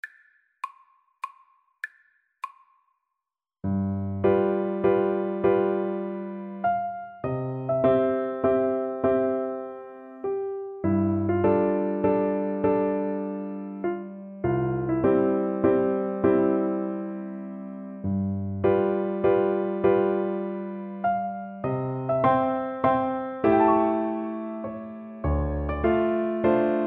prelude a, Flute; chopin

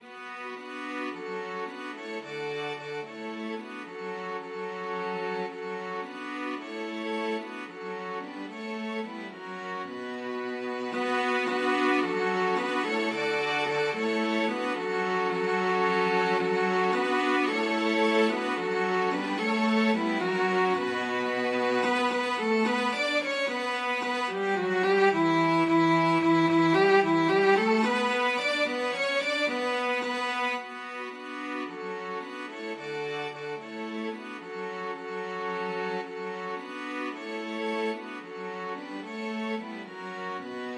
gaudete STRINGS, string quartet

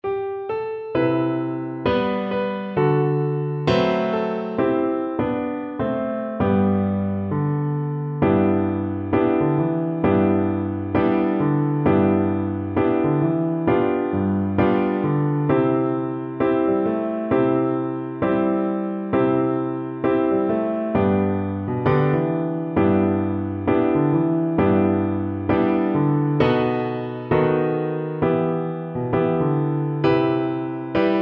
Double Bass

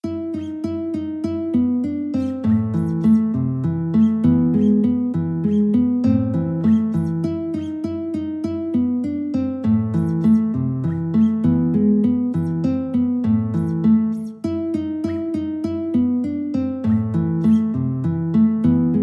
guitar duet

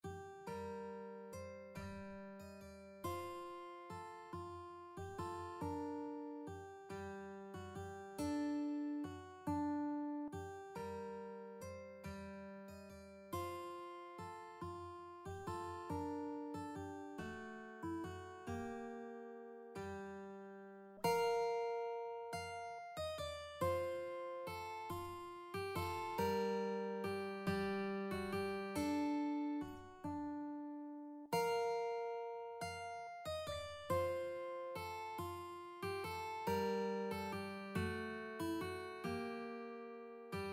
what child is this, mandolin

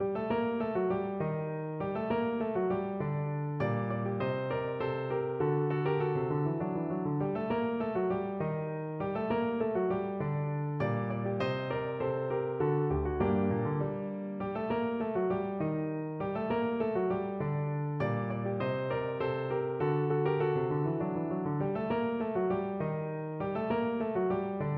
piano

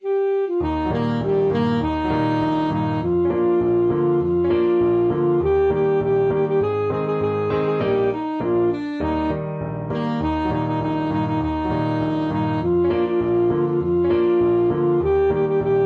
saxophone

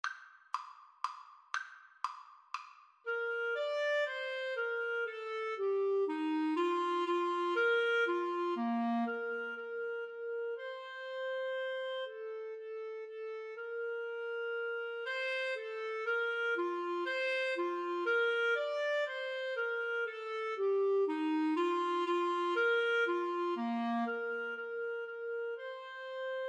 Menuett, Clarinet Duet

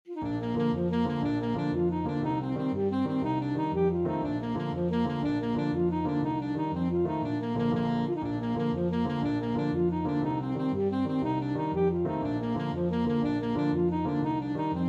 irish jig medley, saxophone

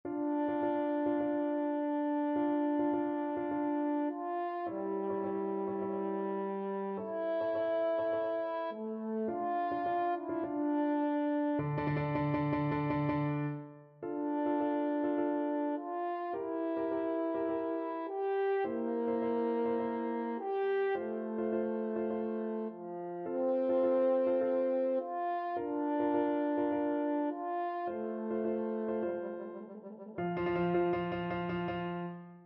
fourseasons, french horn

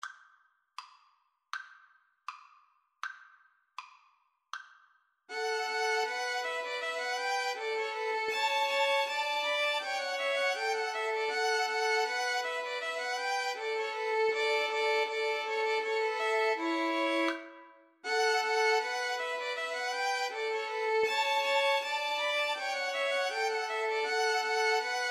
Violin Trio; Bach Gavotte Cello Suite 6

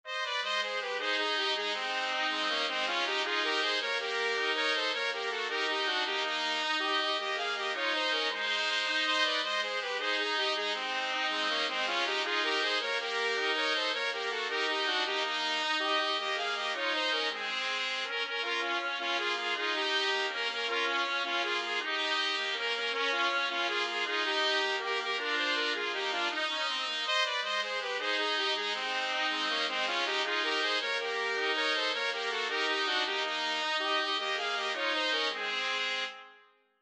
mexican hat dance trumpet trio

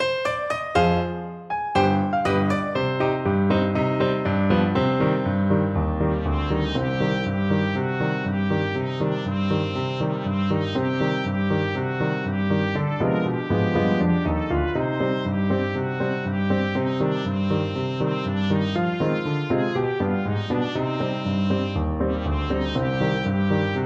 mazel tov trumpet

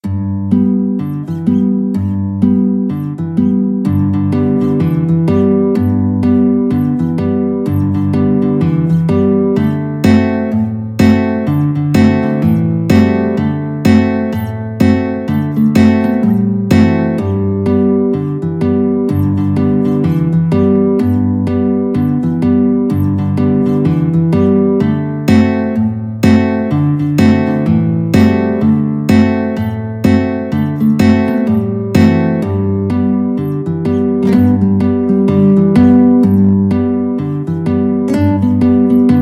tom dooley, guitar duet

